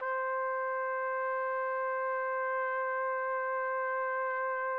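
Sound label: trumpet; Roland - LA Composer vol. 3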